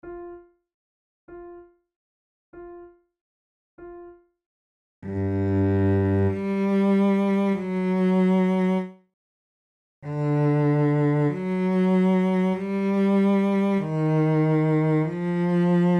recorder sonata in g minor adagio; Marcello

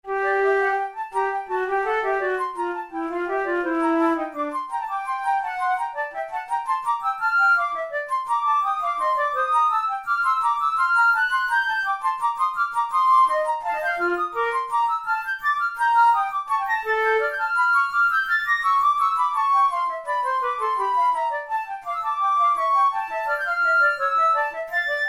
in g minor, flute

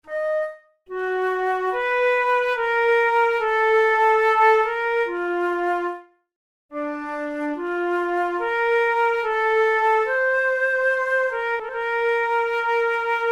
melodic exercises, flute tunes

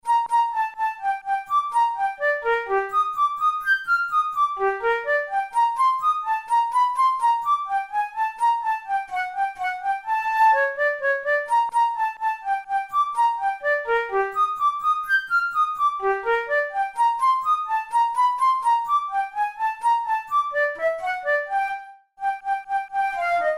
study in g minor, flute; kohler op33 no1 study no9

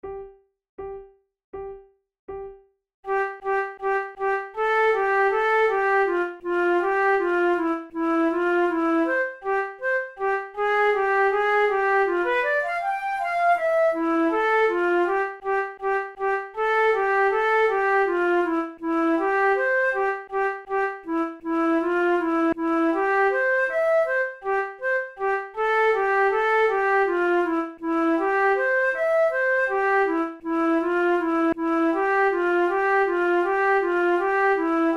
duet, flute

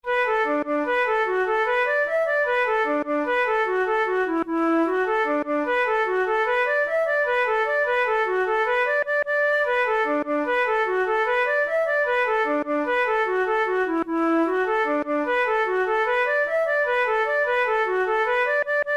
jig

